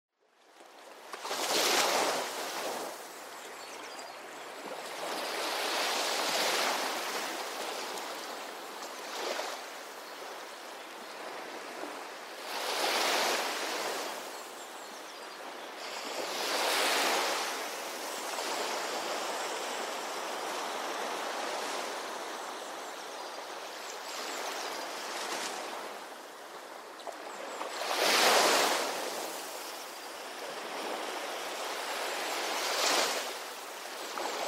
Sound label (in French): bruit des vagues; Etude détaillée des acouphènes